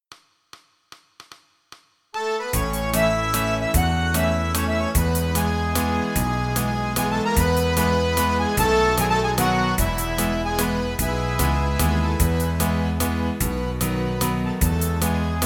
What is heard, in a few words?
E, backing tracks irish country pop